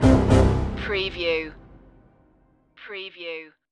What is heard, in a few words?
stalker stinger